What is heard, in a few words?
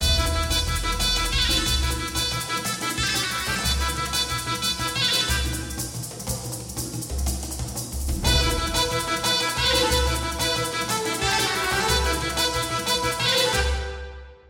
noaa folk